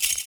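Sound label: PERC, percussions; Univers Sons - Raricussions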